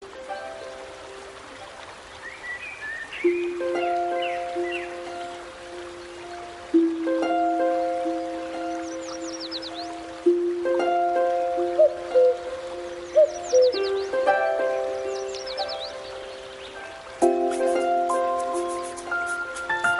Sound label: huawei melody tone